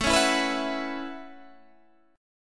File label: A 6th Add 9th A down Guitar Standard; A6add9; strummed